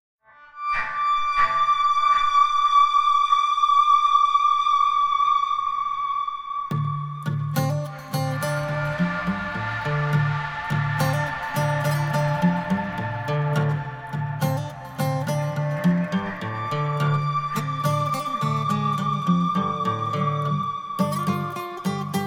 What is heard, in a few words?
Menu music; Postal 2